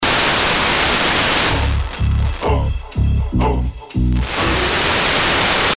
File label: slovakia